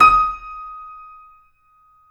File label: C7; East West; The Ultimate Piano Collection